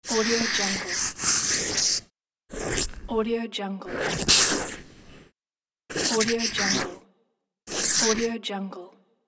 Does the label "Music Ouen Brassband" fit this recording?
No